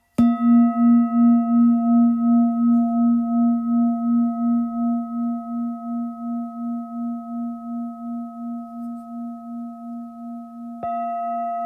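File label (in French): Bol fullmoon la diese, bols chantants tibétains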